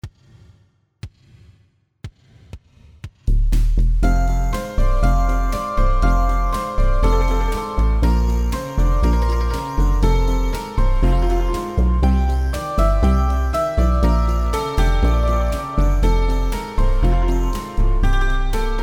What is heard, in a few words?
Cimbalon Blues